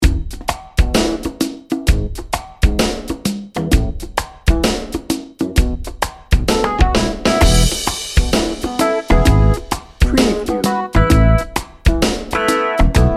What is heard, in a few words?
lazy day no guitar